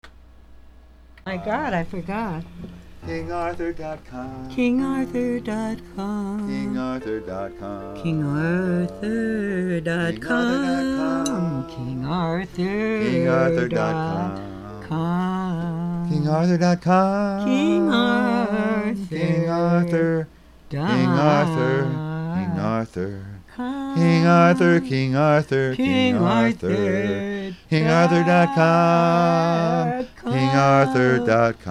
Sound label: singing promo